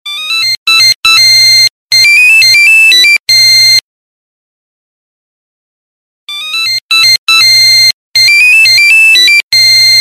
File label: Siemens A35